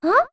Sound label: Huh; Bomber: "Huh?"